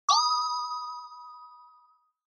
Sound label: Inspiration Low; Onoma